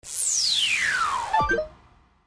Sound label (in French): FX CARTES